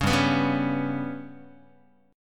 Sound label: G Major Flat 5th G down Guitar; GMb5 strummed; JGuitar